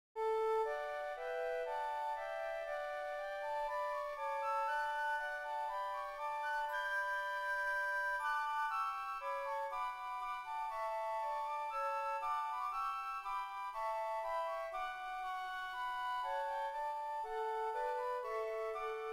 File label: Hymn Flutes